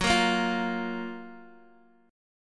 Gb Major 7th, Guitar DADGAD; chordshape; down

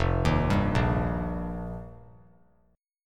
F 9th Suspended 4th F, chord; arpeggiated; JGuitar

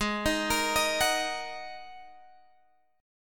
Ab 7th Suspended 2nd down Guitar Open G; arpeggiated; Ab7sus2 Chord

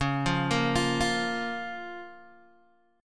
Db 6th, Guitar, Open G

